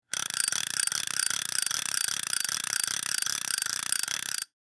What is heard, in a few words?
Fishing rod reel fast